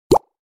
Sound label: xiaomi notification loud